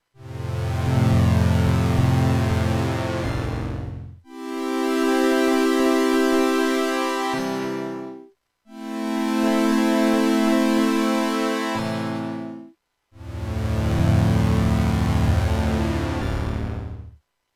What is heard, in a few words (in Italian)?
Suono generato con X FORM